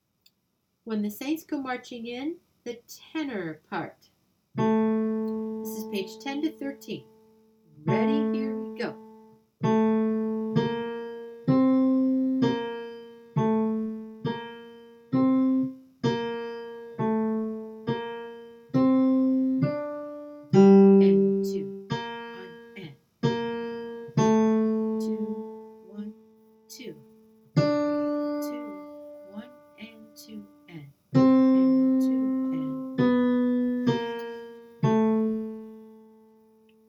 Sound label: tenor